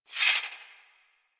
Swing; Balance Two; Warframe; Weapons; Melee; Modular Melee

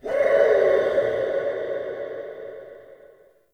Cave Mysterious Distant, Ambience; Midrath Island; Shrine Cave

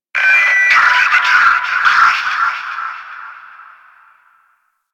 Fortress Exterior Notification; Ambience; Warframe; Grineer Fortress

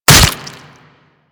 Barrage Hit Flesh; Warframe; Warframes

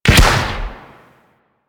Grenade launcher Shot; Warframe; Weapons; Corpus

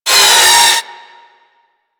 Metal Impact, Weapons; Warframe; Tenno; Miter; Hit Effects

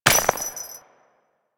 Step Ice, footsteps; Warframe; Grineer Royal Jester; Foley

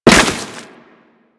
Crewman Step Dirt, Footsteps; Warframe; Corpus

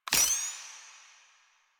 Pistol Laser Aim; Weapons; Warframe; Tenno; Lasrian

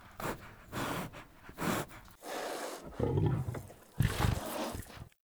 Wolf Idle Sniff; Animals; Vocals

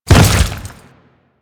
Step Goo, Footsteps; Enemies; Warframe; Grineer

